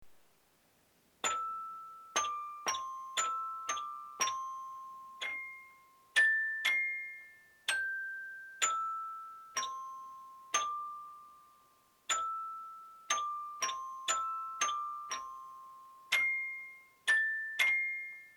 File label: Magic Xylo; toypiano